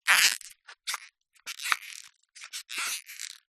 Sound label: packet battery empty plastic squeeze; zapsplat